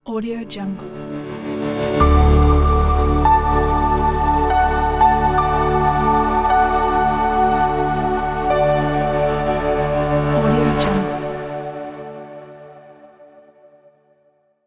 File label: clean elegant logo reveal